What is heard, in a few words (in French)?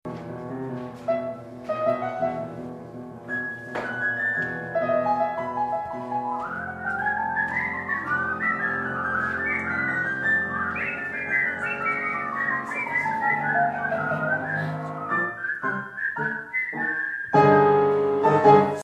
marche des nains sifflee